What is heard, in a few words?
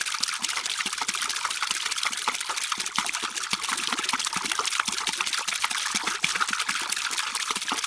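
water pipe; Star Wars Knights Of The Old Republic I; XBOX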